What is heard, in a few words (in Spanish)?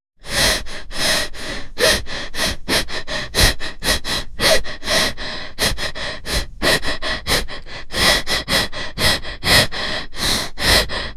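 respiración agitada, anxiety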